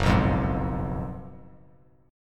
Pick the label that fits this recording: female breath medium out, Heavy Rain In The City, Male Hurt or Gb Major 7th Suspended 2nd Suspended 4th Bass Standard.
Gb Major 7th Suspended 2nd Suspended 4th Bass Standard